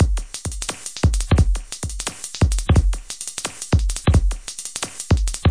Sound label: 174 poppy ringer, drum loops and breaks; 161 to 180 bpm